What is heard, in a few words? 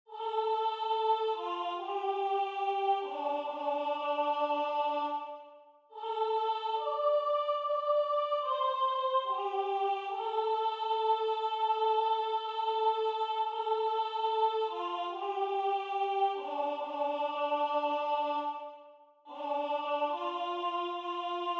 bless the lord my soul sopraan